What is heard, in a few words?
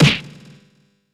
Strong Hit; Super Smash Bros